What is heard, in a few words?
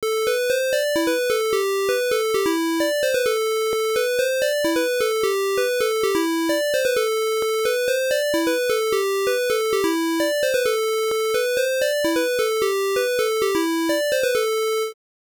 Night Time; Guardian of Silverkeep